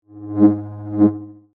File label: Scanner Beam Short, Scifi; Science, Fiction; Tech